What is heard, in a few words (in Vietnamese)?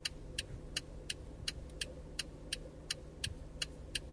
Tieng xi nhan xe o to